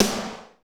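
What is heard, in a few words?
SNR snare, Techno Rock; Northstar; Drumscapes Roland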